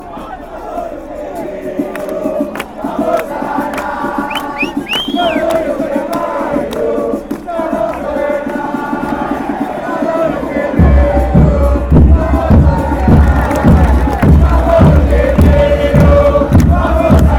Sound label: huracan chant